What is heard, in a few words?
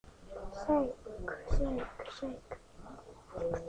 shake